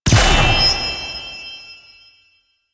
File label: Charge Ability Cast; Enemies; Abilities; Warframe; Duviri; Duviri Dax; Dax Knight